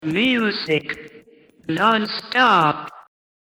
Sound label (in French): Isolated Vocals